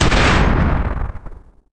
Bomb; johnny upgrade

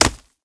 destroy; zig zag line

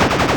boss shoot loop; johnny upgrade

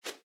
tap; balloon jump

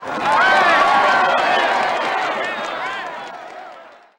hit react; home run challenge

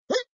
jump; stack jump

